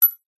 industrial metal washer drop or set down on concrete paver